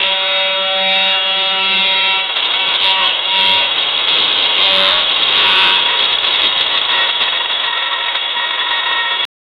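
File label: failure of a metal machine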